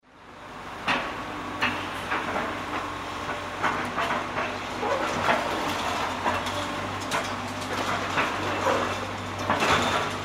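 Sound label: construction work sound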